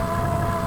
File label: Spaceship Canopy Loop; SFX Kit; Sci-Fi Loops